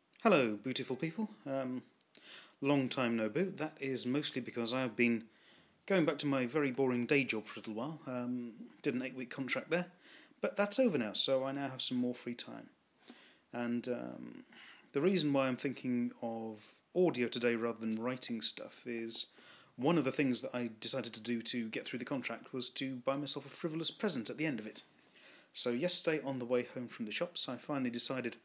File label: ukuboo